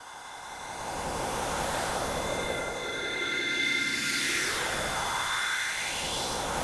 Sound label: PAD, ATMO PADS; Best Service; XX Large